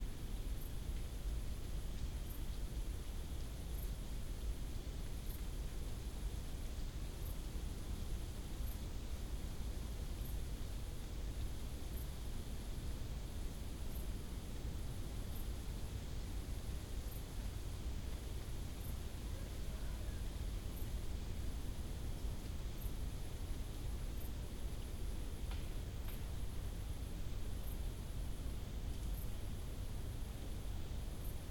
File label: Nighttime-Woodlands Windy Trees Rustling Quiet; Systematic Sound; General Ambience Series; Nightscapes 01